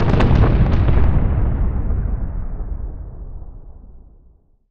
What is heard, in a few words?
distant explosion; dod